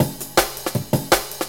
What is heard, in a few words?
160bpm, breakbeats